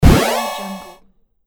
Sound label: retro video game progression chord